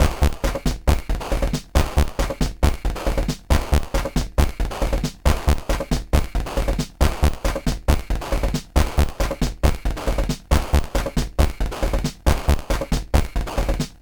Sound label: Beat using individual pitched and bent sounds 2, Tr 505 bend